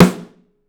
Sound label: Drums, STEEL; 3D Drums